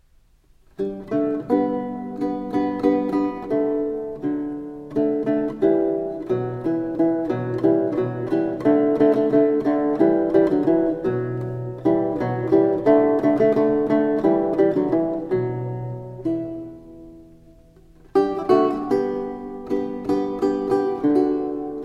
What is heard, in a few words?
Lute; Gerle Newsidler - Scaramella (Josquin des Prez)